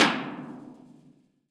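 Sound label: Fort Worden Tunnel, Impulse Response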